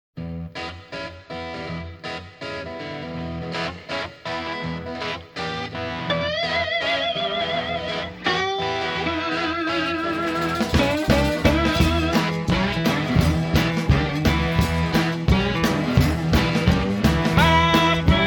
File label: backyard blues